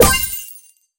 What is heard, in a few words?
Caisse Break